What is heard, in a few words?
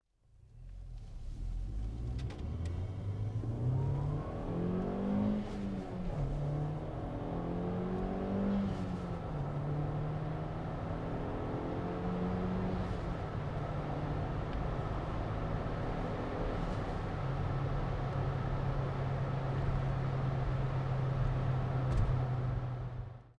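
magnaflow incar, exhaust systems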